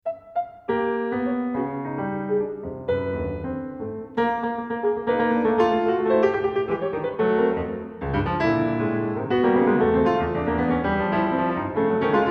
Piano Suite Prelude; Arnold Schoenberg; Op25

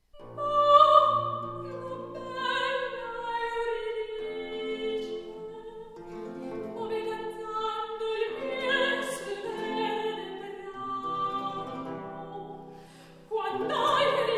Dialogue in recitative; Por quel vago boschetto; from le musiche sopra l'euridice; Jacopo Peri

